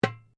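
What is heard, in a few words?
Drum Darabuka Edge piano, Egyptian Darabuka Drum; Commanly available Egyptian Darabuka Drum; Drums; Sound Effects:Musical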